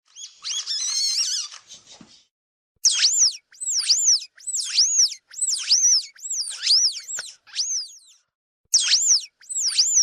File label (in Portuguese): Lontra